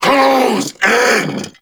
Vocal Player Spotted; Warframe; Duviri; Dax

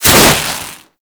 Heat Gun Hit; Warframe; Grineer

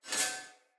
Split Sword Accent, Swords; Weapons; Player; Starter

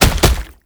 Proto Infested Gallop; Enemies; Steps; Warframe; Brachiolyst

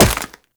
Wall Jump Ice; Warframe; Tenno; Wall Run

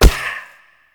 Choir Bow Quick Fire; Warframe

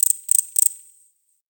Forest Close Insect Chirp; Warframe; Grineer

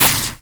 Laser Impact, Weapons

